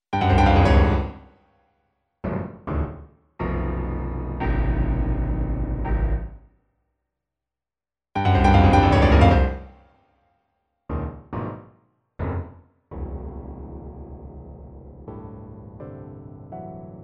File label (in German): Klaviersonate; Kaiser